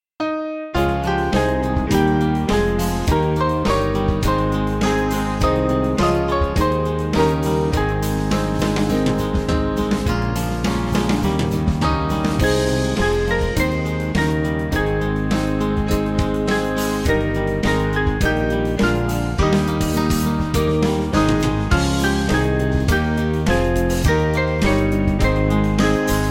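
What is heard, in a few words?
Band, Backing Files for Churches; Caneuon Ffydd 163; Downing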